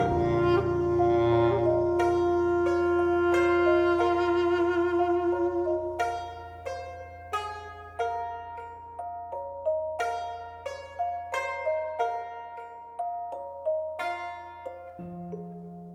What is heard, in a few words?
Calm Bridge 60bpm 4bar